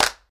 clap single shot g sharp key, Loudest frequency: 3204Hz; single hits one shots